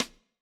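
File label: Snare Stick, drum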